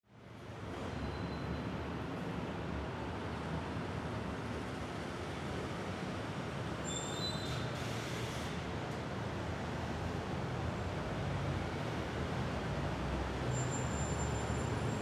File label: traffic